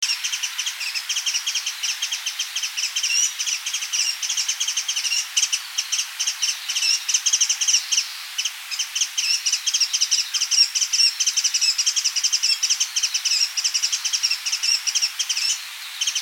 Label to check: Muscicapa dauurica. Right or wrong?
Right